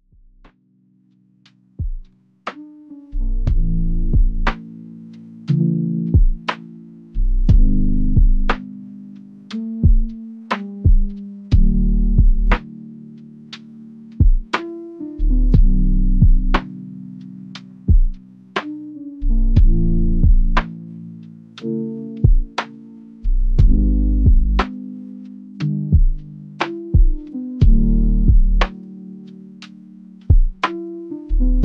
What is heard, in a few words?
lofi